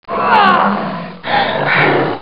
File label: Vampire